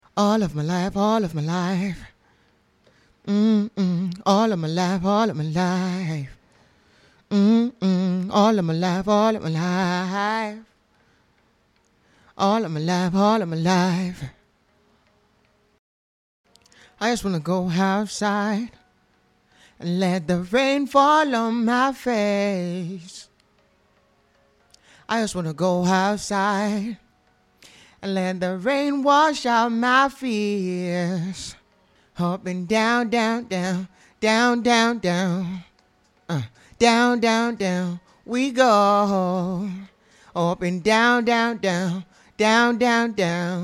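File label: pop rnb 118 F sharp major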